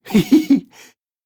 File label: Laugh; Warframe; Alad V Boss